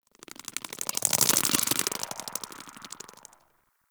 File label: tree critter; Ambience; Props; Warframe; TheNewWar; Zariman